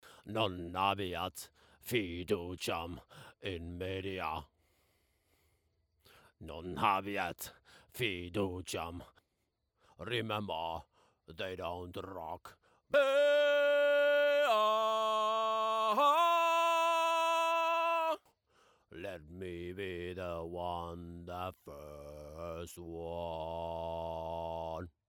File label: Vox Choir; Laag